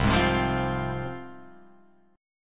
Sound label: C Suspended 4th Sharp 5th C Guitar Open F, Csus4#5 chord; Csus4#5 strummed; JGuitar